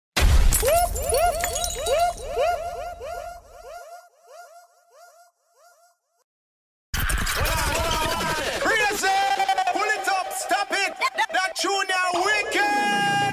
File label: DJ sound effects, DJ Effects Sound